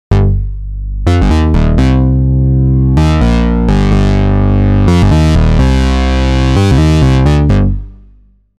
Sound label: BASS Dubspace